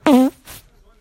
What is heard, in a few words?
fart